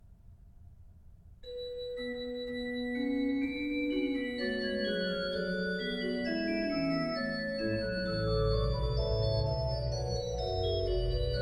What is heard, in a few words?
Helft mir, Gottes Güte preisen, BACH ORGAN, Chorale preludes; Orgelbüchlein